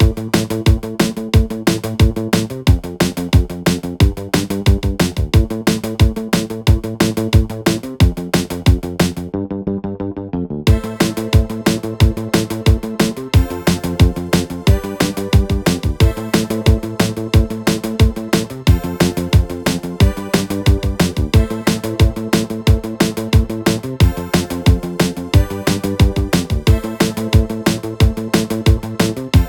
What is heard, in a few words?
bass loop